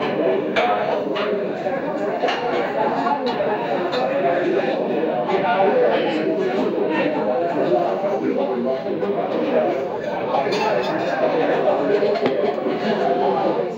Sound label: canteen ambience plates, background chatter